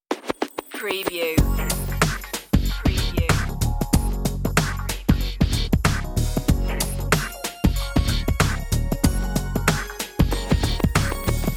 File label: 90s hip hop sitcom sting